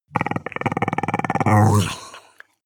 chihuahua growl smack; natur-tiere; hunde

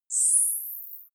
zoom out, signale

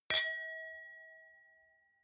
wine glass different clink soft; berufe; gastronomie; soundpool

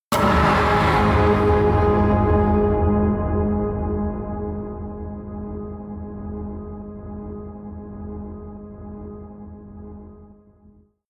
hard buzz synth, impacts; hits explosionen

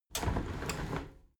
window handle squeak turn open regular; objekte; fenster

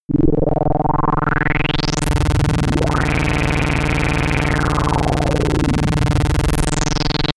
wobble; bewegung; comedy-cartoon; soundpool

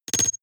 noise metal hits splutter; soundpool

